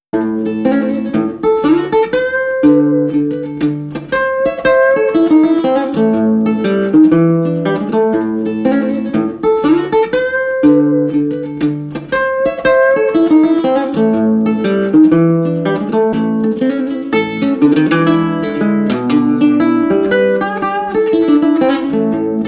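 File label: Street Guitar Acoustic Blues, 120 bpm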